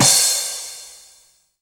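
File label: crash reverse, Dance Mega Drums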